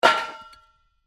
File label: Metal Impact Hit Metal Bar With Rock; Stone hit metal clank; Metal Sound Effects